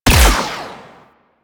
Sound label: Energy Ball Fire; Mine Osprey